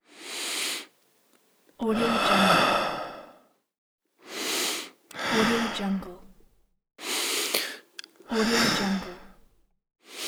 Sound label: male breath